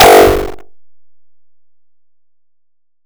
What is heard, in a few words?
MASSIVE LASER; FLEETCOM; bfxr